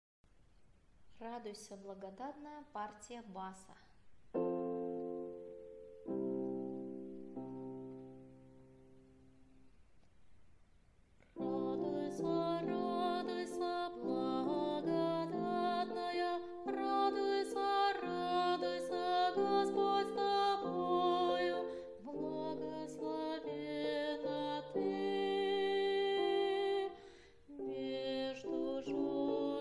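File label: bass; Radujsja Blagodatnaja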